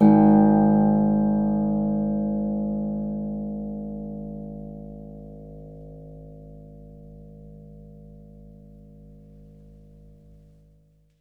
H A1, Harp; Miroslav Vitous - Percussion & Harp

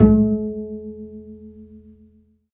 Bass, Roland

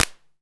EH16 SD, Electro-Harmonix DRM-16

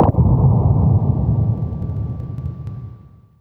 THUNDER HIT, Impacts, Hits & Booms; Distored Reality

